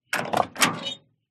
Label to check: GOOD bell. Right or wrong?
Wrong